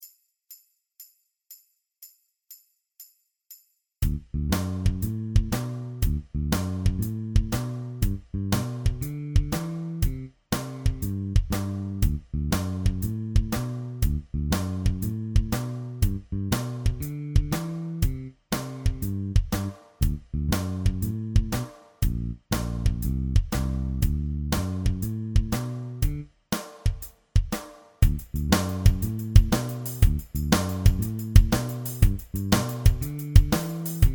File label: bass guitar